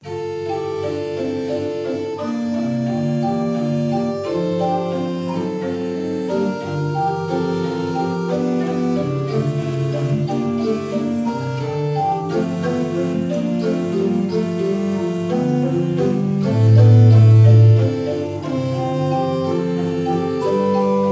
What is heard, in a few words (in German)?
Oehms 6 II P, orgel